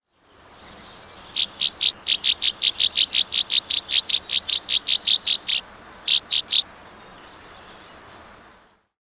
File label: Northern Rough-winged Swallow, Stelgidopteryx serripennis; swallows swifts